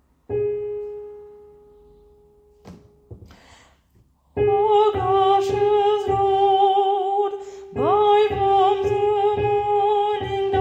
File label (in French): tenor; Finlandia